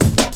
Step Ez Cut, drum loop, jungle, 168.5; Zero-G Jungle Warfare 1, 2 & 3

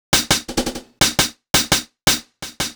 Hi-Hat, Hi-Hat Loops; KJ Sawka - Drum n Bass & Beyond; Drum Tool Box

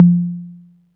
CONG TOM, CONGA BONGO

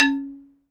XYLOPHONE D#; Synclavier; World & Orchestral; ILIO

